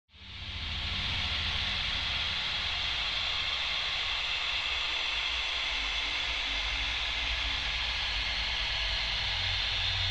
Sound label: Ambience UFO Engine Room. Air Pressure Hissing Loop That Morphs With Modulation.